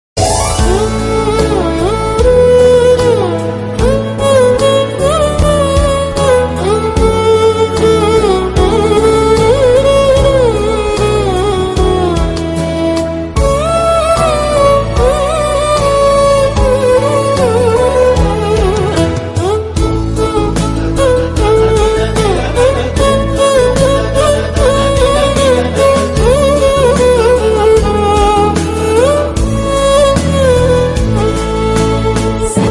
christian violin ringtone

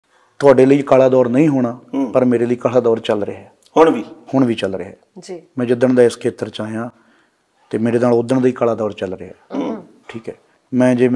emotional

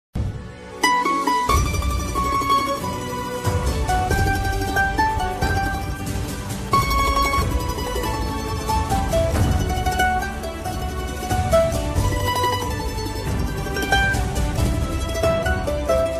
background music

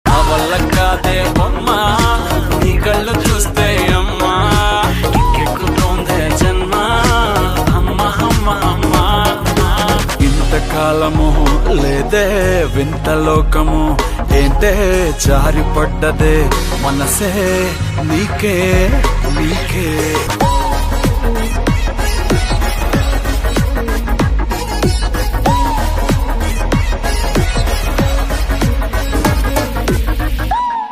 lyrical song ringtone; Ooru Peru Bhairavakona

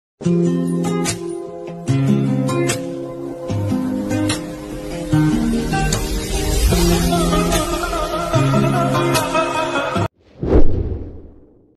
feelings bgm ringtone

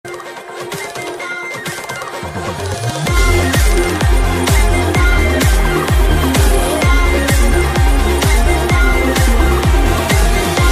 bgm music hearttouching; premalu

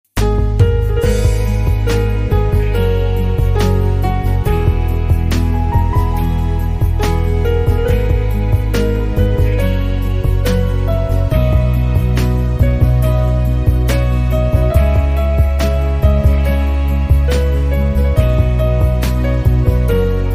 christian ringtone; jesus ringtones

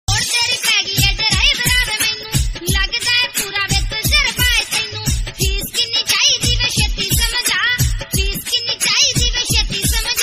song ringtone bgm ringtone

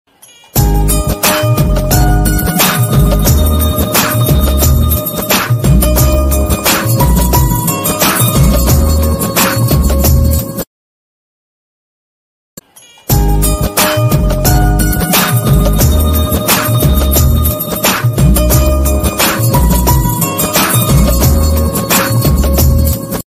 guitar bgm ringtone; namo venkatesa movie; venkatesh

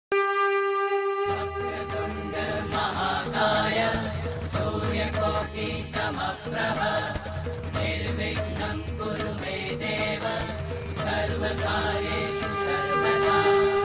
ganesh ringtone bhajan mantra; ganesh ji; jay shree ganesh

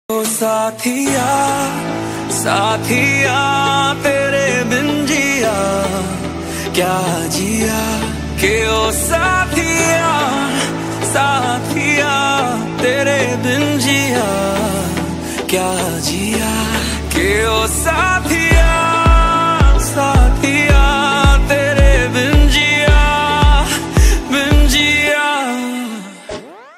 song ringtone; akshay kumar; rakul preet

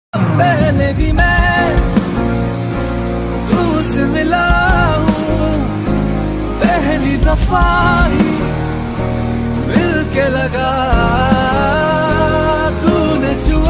animal ringtone new song ringtone

